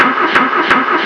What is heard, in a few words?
cranking